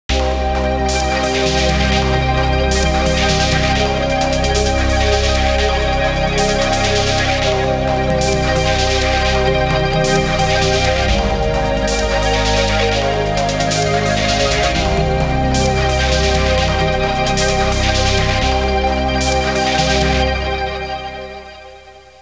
musica elettronica; electronic

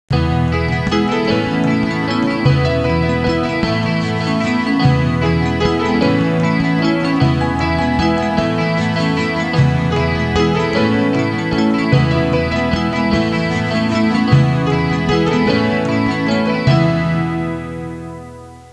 suonerie musica hawaiian

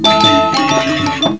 suonerie musica ritmica

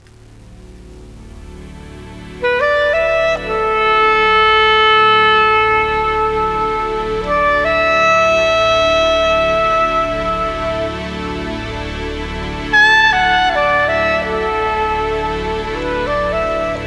suonerie contemporanea; musica contemporary

